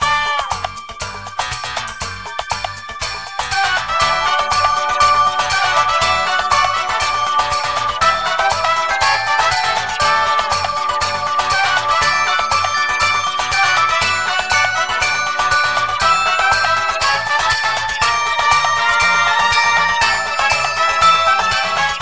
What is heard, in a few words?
Christmas Remix